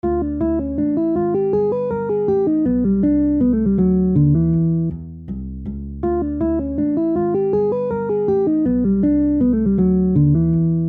major 2 5 1

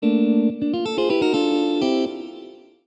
chord

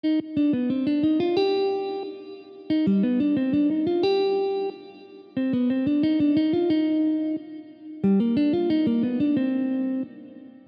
minor 2 5 1

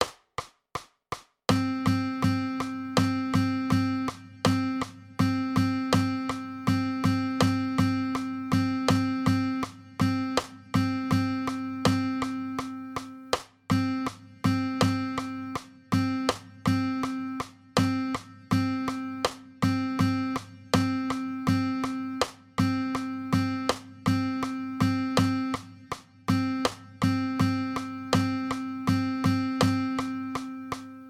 half note 160

